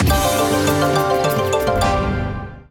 free spin init; Amatic; Big Panda

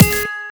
stop bonus; Amatic; Fruit Box

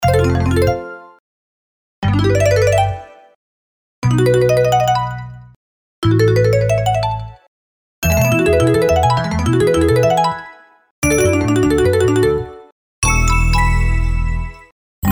win sounds; EGT; 81 Wins

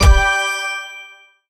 stop bonus; Amatic; Book of Fruits

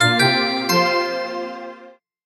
win; Amatic; Book of Fruits

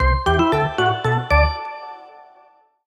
win; Amatic; Fortune Girl

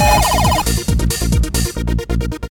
expand; Amatic; Hot Star

